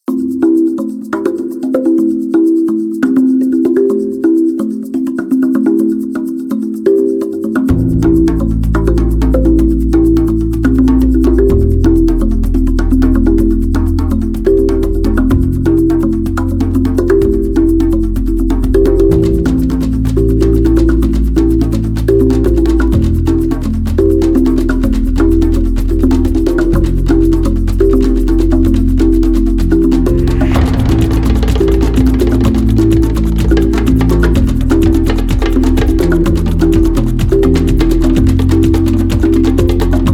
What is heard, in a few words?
misterious drums